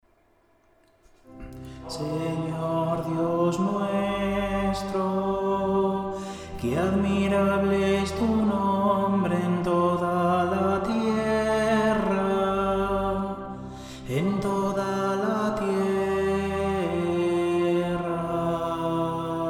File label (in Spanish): tenor salmo